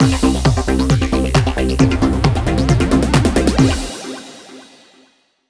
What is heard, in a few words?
Stab; Buzz! Junior Robojam; PS2